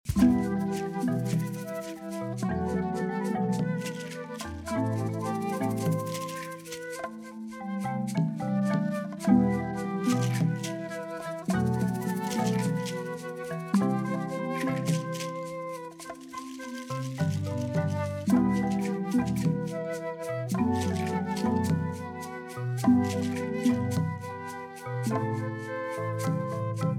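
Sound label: jungle, underwater; Monkey Island Jungle; Complete Score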